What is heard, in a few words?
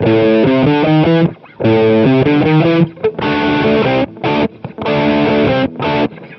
guitar, Loops Phonky